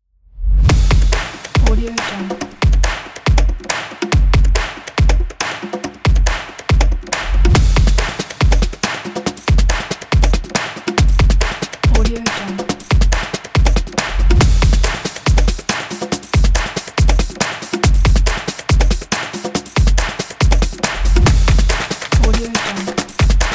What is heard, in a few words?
kick claps percussion